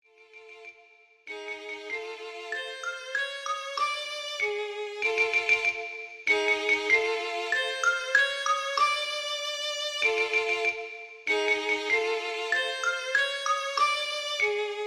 Raga Divyamani

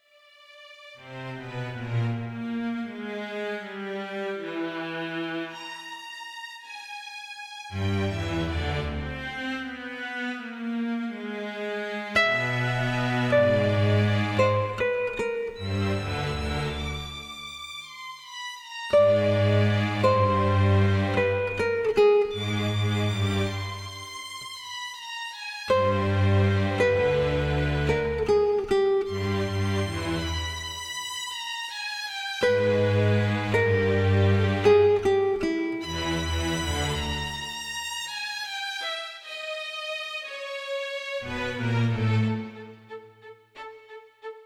Symphony in Raga Jyothiswarupani

